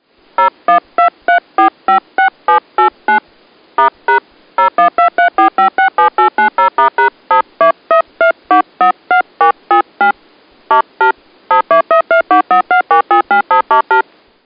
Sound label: dtmf tones fm